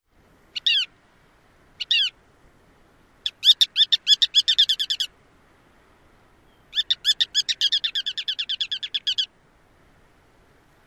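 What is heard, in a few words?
ruddy turnstone calls near nest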